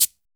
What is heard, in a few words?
POP CABASA F; R+B KITS2